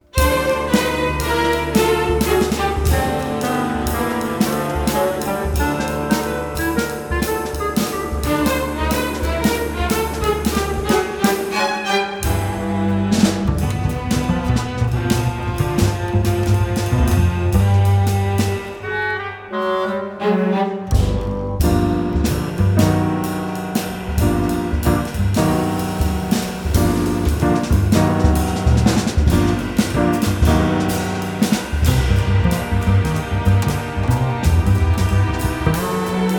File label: sketches for bass orchestra